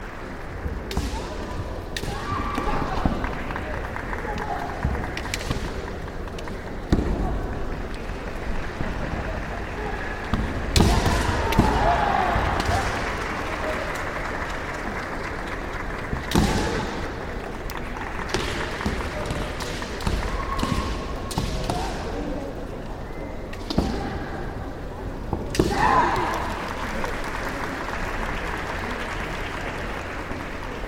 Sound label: kendo boys; sports